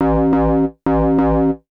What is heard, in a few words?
TECHNO BASS 140 A